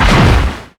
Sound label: damage hits explosions, Warships